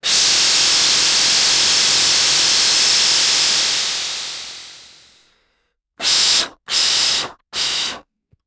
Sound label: air pressure burst, compressed air